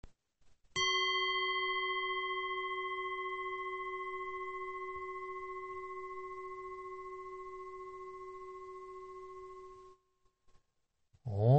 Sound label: Purna Mantra